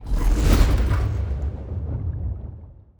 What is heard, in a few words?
ship juggernaut move; aquatics